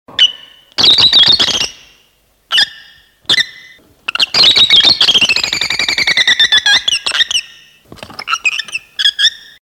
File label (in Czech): andulka